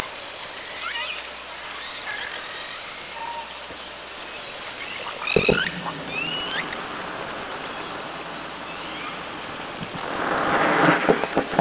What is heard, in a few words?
Orca sound Night Passby